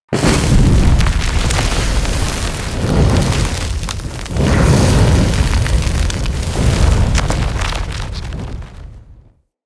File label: Siege Balloon Ignite; Encounters